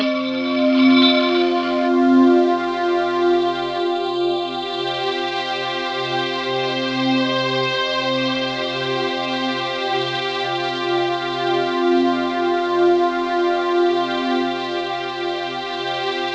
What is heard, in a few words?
Flangesyn; Best Service Gigapack 1